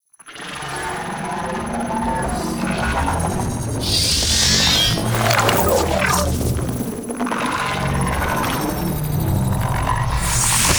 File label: Arm; Lotus Reveal; Eidolon